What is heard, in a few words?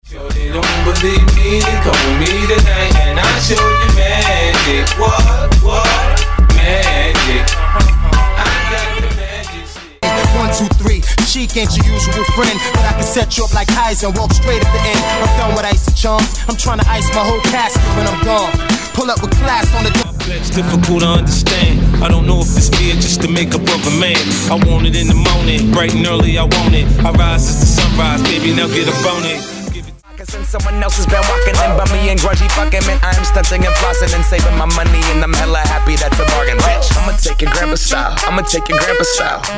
Throwback Hip Hop N RNB Megamix